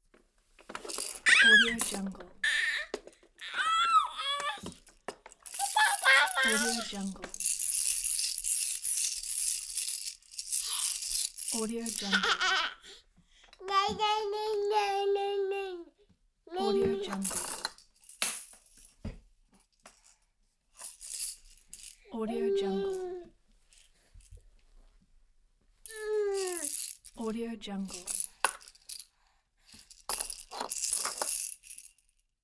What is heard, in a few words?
one year old babbling playing with rattler